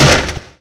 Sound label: wood crash; svencoop